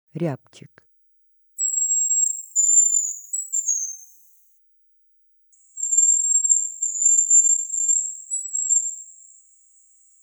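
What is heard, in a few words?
hazel grouse voice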